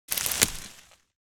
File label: Harvesting in the garden harvest plant cut